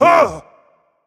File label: fear; smite; achilles